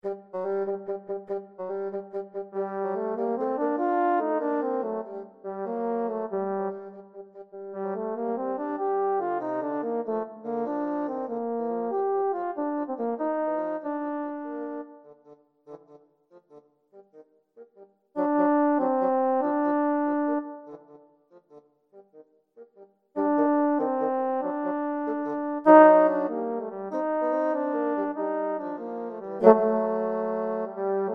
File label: bassoon horn duet, french horn-bassoon duet; elgar; serenade